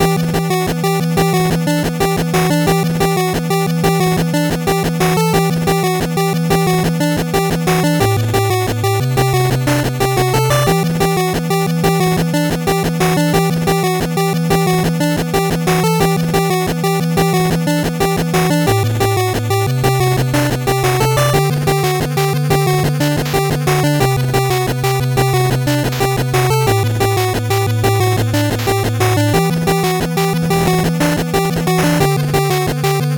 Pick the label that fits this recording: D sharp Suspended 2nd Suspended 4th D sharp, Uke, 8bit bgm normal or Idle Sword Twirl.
8bit bgm normal